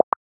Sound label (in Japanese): メッセージ